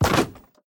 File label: wood land; Golden Crayon Sounds